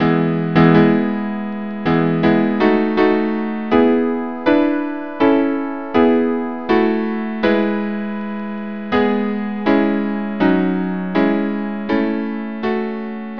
trio

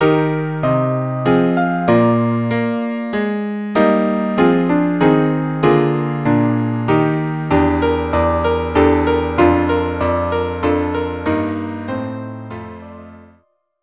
quartet

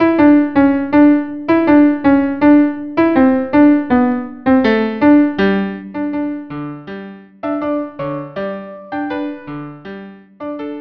Tango violin viola

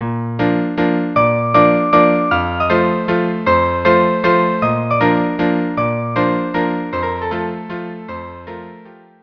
string quartet

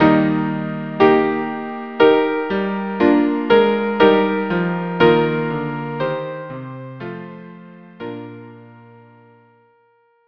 viola; gloria sei dir gesunger

